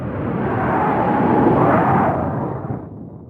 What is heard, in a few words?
missile swing; 3D-Airforce-Simulator